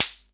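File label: SR95 snare drum; Best Service; Dance Mega Drums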